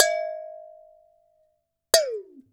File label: WATERBEL, WATERBELL; Univers Sons - Raricussions